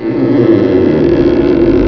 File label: creak